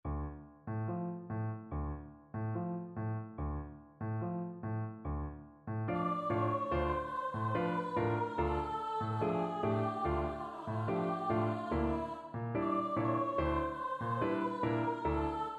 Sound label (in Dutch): bas, koorpartij oefening; Bizet (Georges); Carmen